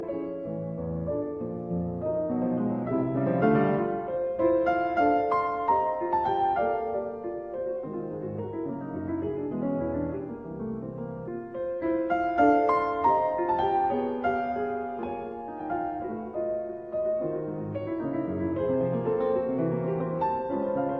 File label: Preludes in F major Allegretto moderato; Op 103